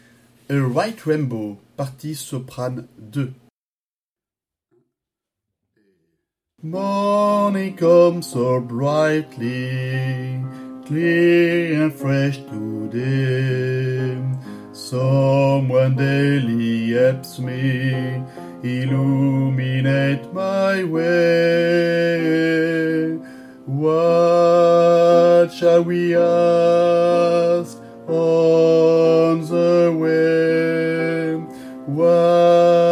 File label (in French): Soprano et piano